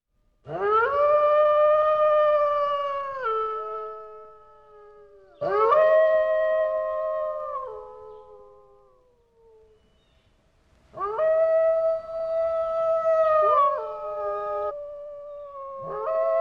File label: Coyote pack howling